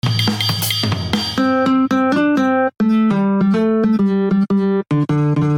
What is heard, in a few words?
Calypso Reggae; soka calypso song